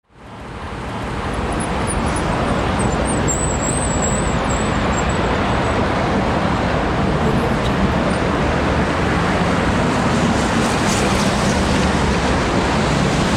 city traffic; alexsandal

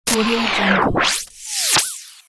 bad radio signal

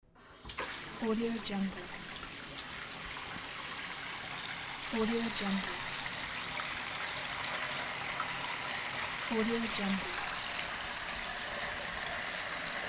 bath filling

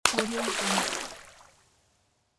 water splash

